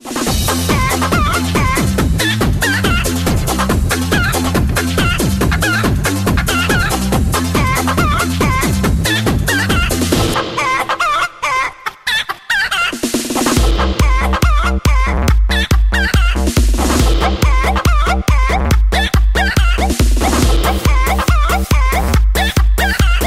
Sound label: Chicken Song